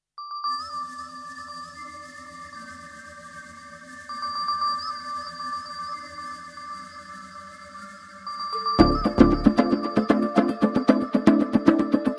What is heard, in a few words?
BGM Telugu Ringtone; Businessman